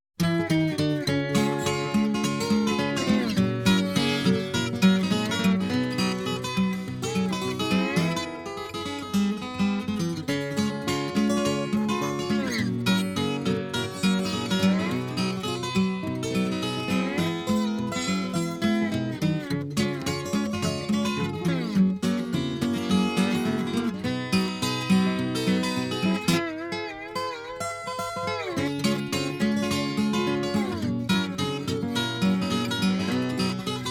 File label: Ragtime Blues